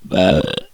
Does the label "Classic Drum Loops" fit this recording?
No